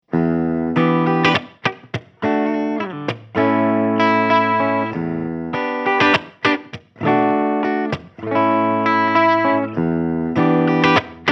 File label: LTD MK-600 Clean